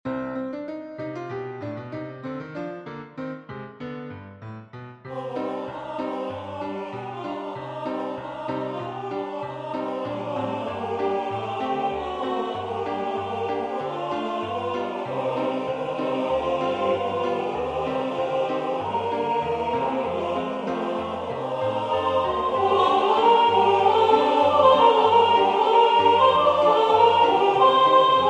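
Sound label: Soprano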